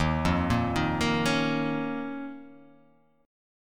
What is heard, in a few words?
D sharp 7th Suspended 2nd